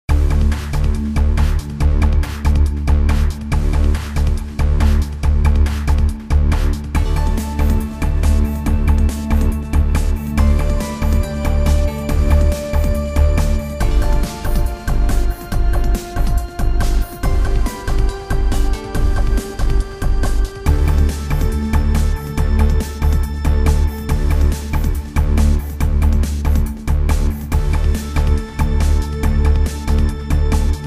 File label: Fighting Movie Confrontation Music